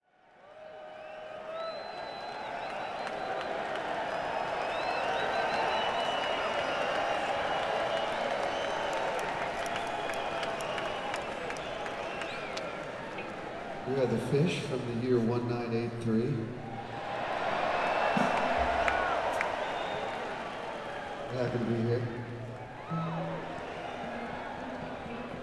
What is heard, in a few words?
Crowd; Las Vegas, NV - MGM Grand Garden Arena